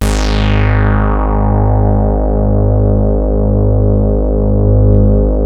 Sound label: MOOG G2; MINIMOOG; Greytsounds VOL-1